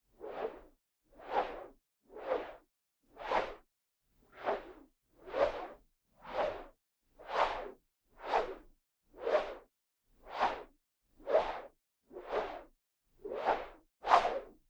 Swipes And Whooshes Metal Grille Slow And Long Swings Stereo; Stereo Ortf 8040; Robobeat